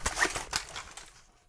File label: clip grab; cstrike